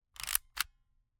Camera, Kodak Extramax, pocket, 110 film, plastic, metal, advance film, slide lever; Eiravaein Works; Vaeyan IV